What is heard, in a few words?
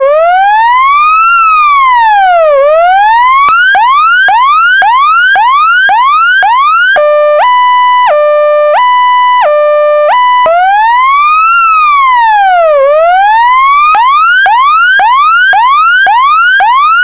emergency piercer siren